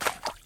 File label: BALL IN WATER; pat & mat game; MINIGAMES; MINIGOLF